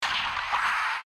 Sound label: gate open; Code7